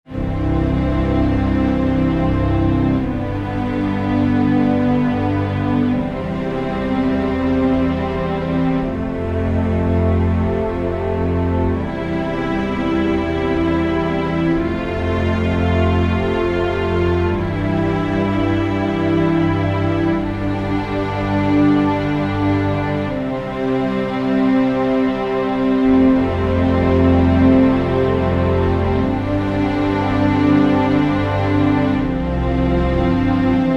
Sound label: Underscore PAD; Worship Flow